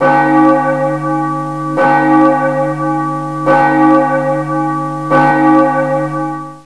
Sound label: bell; openffa